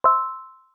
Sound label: direct msg